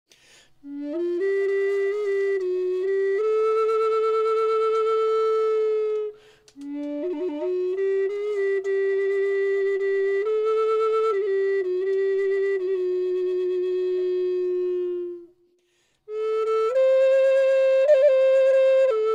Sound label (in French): Ré Grave 432hz